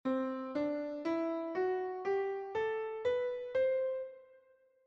Lydian, mode, bells

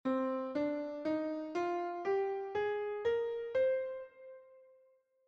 Aeolian, mode, bells

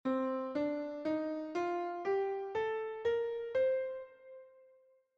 Dorian, Mode, bells

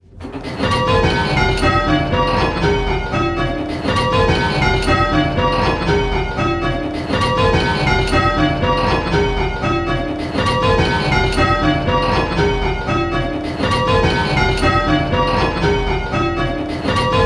Striking 6-Bell Rounds; Pebworth

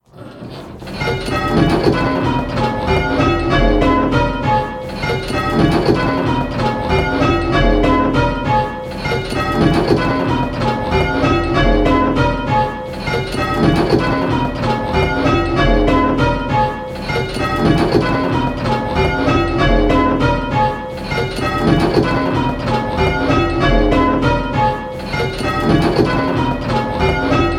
Dorian, bells

